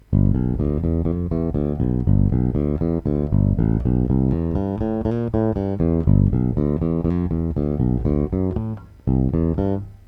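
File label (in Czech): Warwick RockBass Infinity 5 Fretless, Warwick Red, jednocívka - jednocívka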